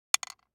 DICE on Wooden Tray, Throw and Roll, Mini, 1 One Die; Samples; ArticulatedSounds; DICE Sfx Party (Extended)